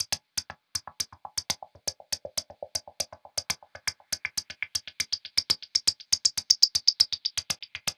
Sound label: Subtractive Beat